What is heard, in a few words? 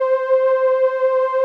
SOLINA, String Machines